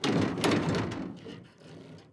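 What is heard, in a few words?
iron grate open; doors; FEAR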